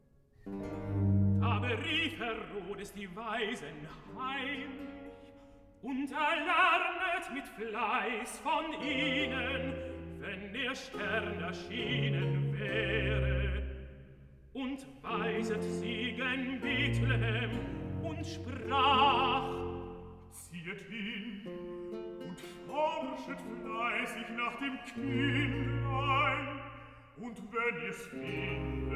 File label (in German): Recitative, tenor, bass; Evangelist; Herod; Da berief Herodes die Weisen heimlic